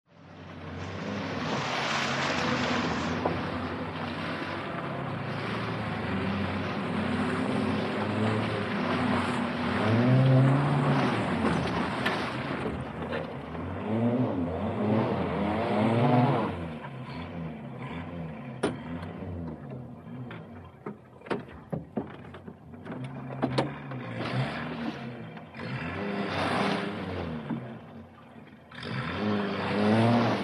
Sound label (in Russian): gruzovaja mashina popadaet v jamu sovershaet manevry i vyezzhaet